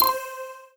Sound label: Hit; Indigo; 16bit